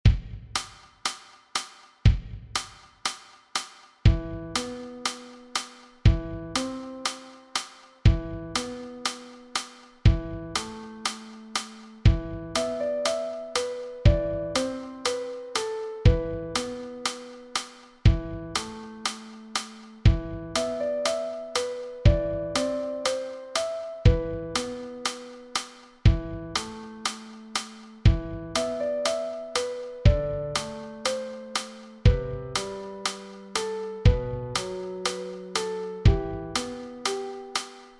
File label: song with metronome